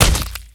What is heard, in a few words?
Bloody punch; Sample Audio Pack; Free Pack